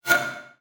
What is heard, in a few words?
Multimedia Internet CD-Rom Flash Whoosh Short